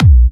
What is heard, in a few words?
Bassdrums UK Trance Kicks; Vengeance Samples